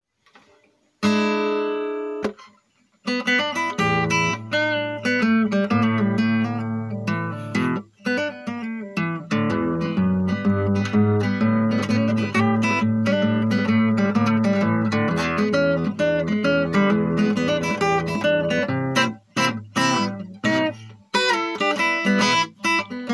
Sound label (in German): Blues in E mit H